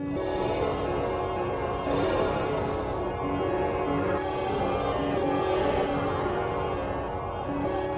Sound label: Black Space - Ambient